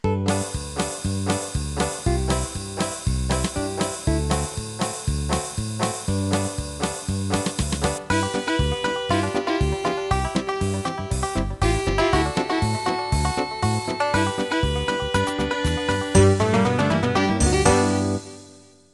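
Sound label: Bluegrass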